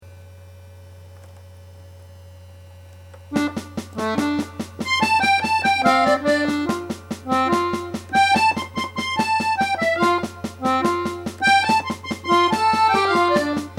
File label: clarinet and melody